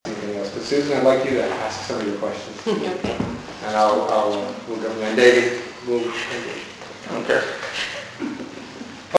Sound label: crying weird